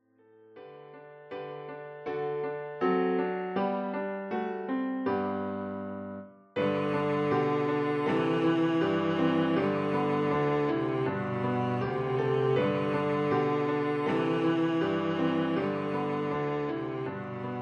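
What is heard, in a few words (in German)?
O du froehliche, Violoncello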